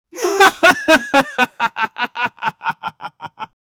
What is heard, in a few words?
adult male laughing